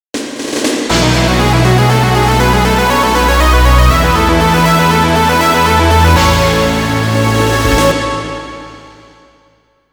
Attack Starting; Clock Tower 20th Anniversary Sound Collection

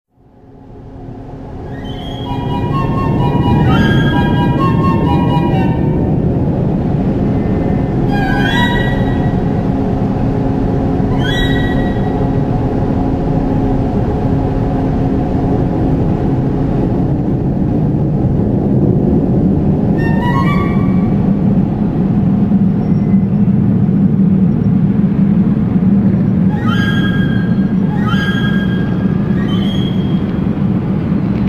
Courtyard Ambience; marvel ultimate alliance; Valhalla (Odins Courtyard Ambience)

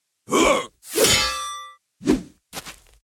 Diraqiguard Spattack

